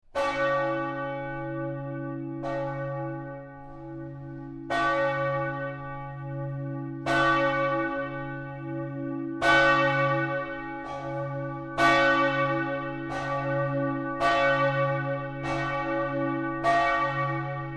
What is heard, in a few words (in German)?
Glocke I; St. Paul Fürth